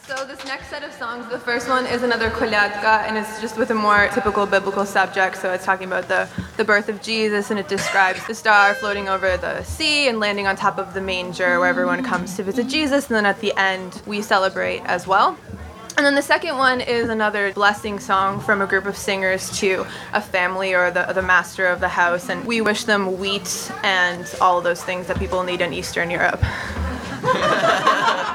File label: carol; Tam u Sviti; Live at Barbes for WFMUs Transpacific Sound Paradise